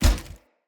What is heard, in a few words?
Foley Armour Step